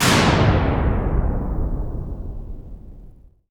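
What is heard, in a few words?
POWER SLAM; Northstar; The Wizard VOL-1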